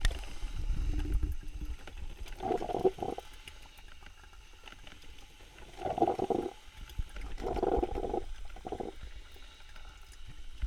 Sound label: Brewing Coffee